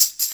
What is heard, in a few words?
TAMB; InVision Interactive; Percussion Slam